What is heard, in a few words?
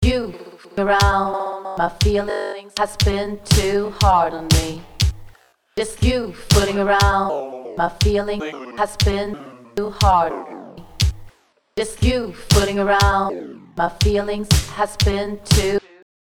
beatrepeat vocal